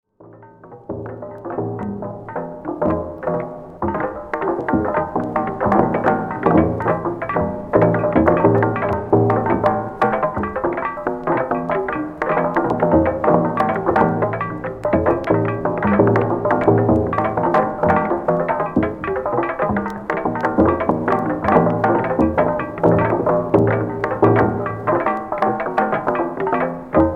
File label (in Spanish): musica electroacustica espanola